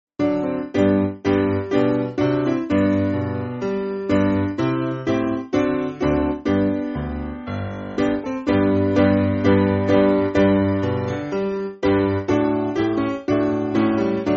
Piano, church music; Let Earth And Heaven; Millenium

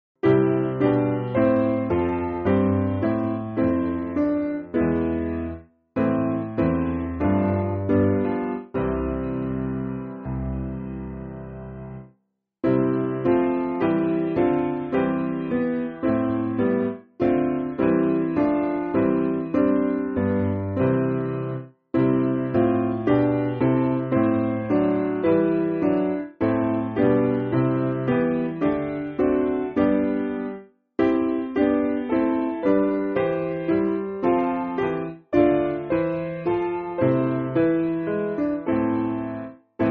Piano, church music; Ubi Caritas

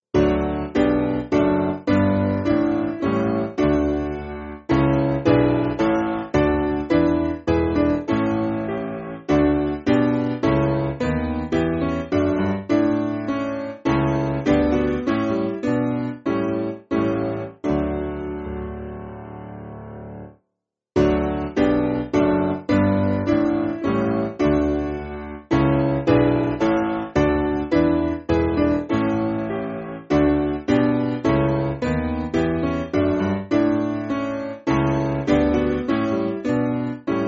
Piano, church music; Holy Spirit; Truth; Canterbury

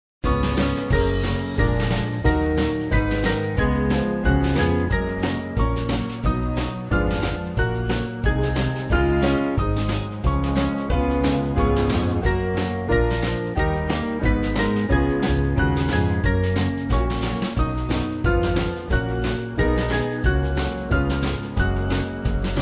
Band, Music Backing Files for Churches

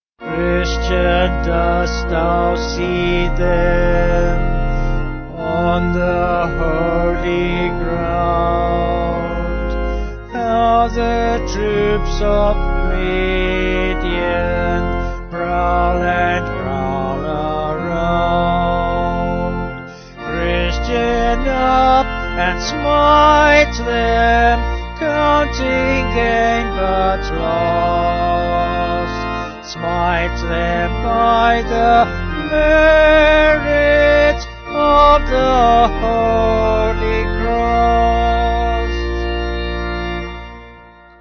Vocals Organ; St Andrew

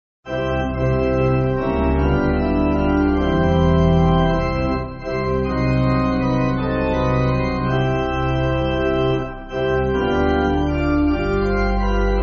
Pipe Organ, Backing Files for Churches; The Beautiful Bright; Sunshine

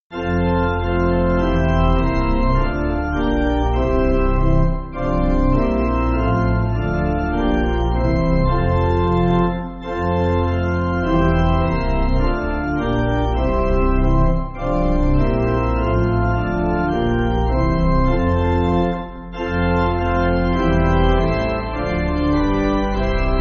Pipe Organ, Backing Files for Churches; You Are With Me; Bach; J.C. Bach

